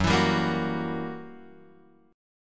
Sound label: Gb 7th Flat 9th, Guitar, Open F, Gb7b9 chord; strummed; JGuitar